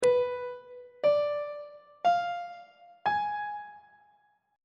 a double sharp diminished 7th chord; treble clef

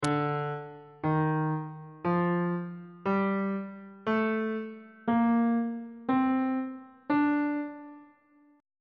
d phrygian mode on bass clef

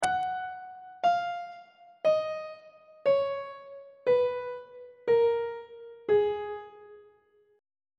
g sharp dorian mode descending on treble clef

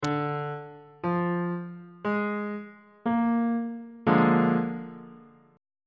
a sharp dominant 7th 1st inversion

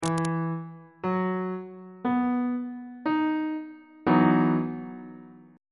d double sharp major 7th suspended 2nd chord

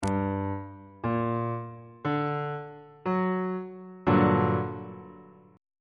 f double sharp minor major 7th chord on bass clef

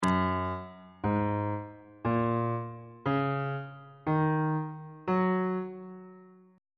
g flat major pentatonic scale on bass clef

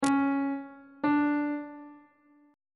c double sharp 8th interval pitches on bass clef

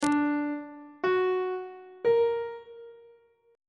d augmented triad chord intervals on treble clef

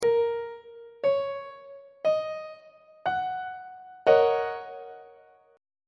f sharp major 6th 1st inversion; on treble clef

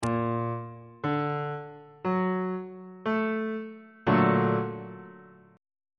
a sharp augmented major 7th chord on bass clef

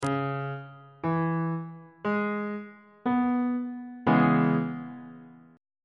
d double sharp major 6th 3rd inversion; bass clef

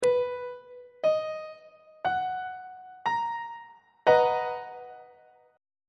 a double sharp major 7th chord on treble clef

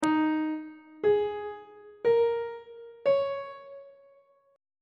e flat dominant 7th suspended 4th chord intervals; on treble clef

